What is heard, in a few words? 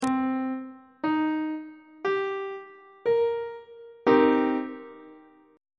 d double flat minor 7th chord on treble clef